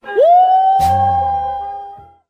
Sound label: "woo" SUNG, voice; ooh ahh